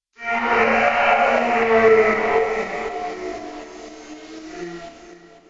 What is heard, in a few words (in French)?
cri